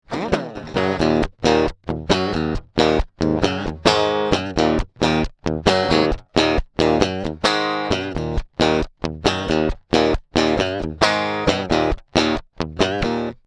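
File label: Twins and Fuzz unprocessed; fender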